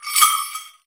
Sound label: AFRICAN BELL; AKAI S6000 CD-ROM - Ultimate